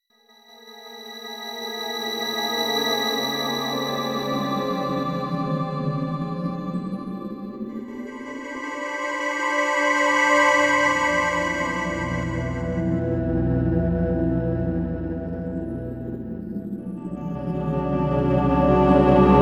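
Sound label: Movie background music, Horror; Mario Artist Talent Studio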